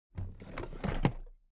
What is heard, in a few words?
toolbox raise heavy